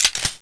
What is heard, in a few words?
slide pull; cz75a; cstrike; csgo